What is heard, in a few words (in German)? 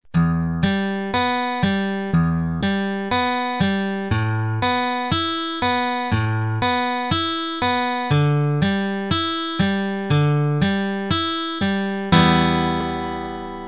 Tonleiter